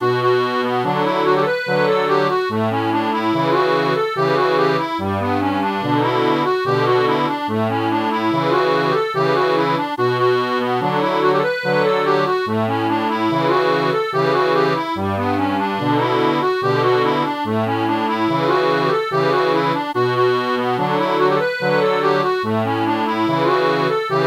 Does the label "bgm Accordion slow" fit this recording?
Yes